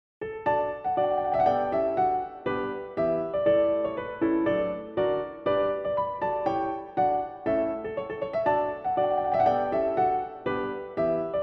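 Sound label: impromptu D major; franck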